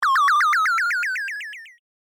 場面切り替え ミョミョミョミョミョミョミョ 上り

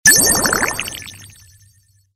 ポーション

